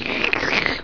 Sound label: leech suck; Emperor - Battle for Dune